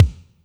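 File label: kick Ambt Elc, Alesis D4; 300 Drum Machines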